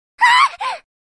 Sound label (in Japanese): 被弾（ダウン中）「（悲鳴）」